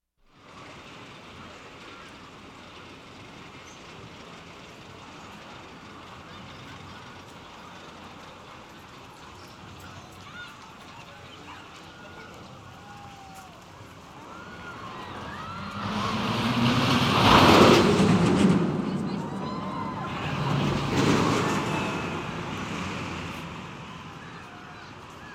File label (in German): achterbahn; Feste-Feiern